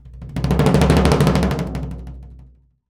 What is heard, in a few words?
AMB TOMB, AMB PERCS; Univers Sons; Basicussions